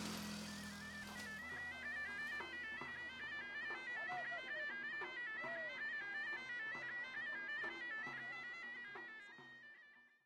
snake Charmer; wild bazaar

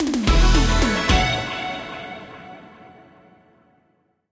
win Medium; hotline2

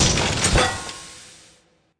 Dispatch; eggomatic

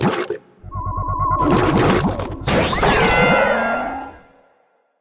cloner Low Win; spacewars

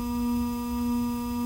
feature Buy Loop Oct Up; hotline2

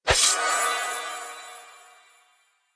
random Wild Sword; warlords

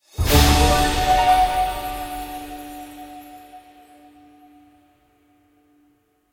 win Medium; wild bazaar

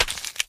fs Hit Light Ice Add; wild worlds

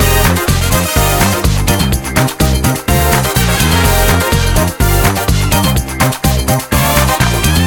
music loop intense; disco danny

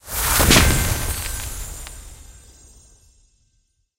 small win; golden tavern

